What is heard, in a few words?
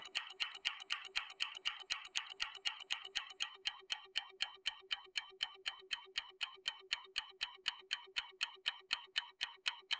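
the sound of a fast ticking, time lapse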